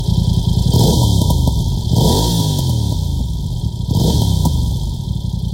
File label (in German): Sound mit Schubakustik; BMW; K83 F900R